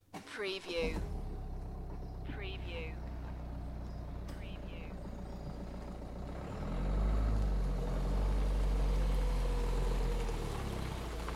Vehicle driving on to gravel drive in a rural setting; beanstalk audio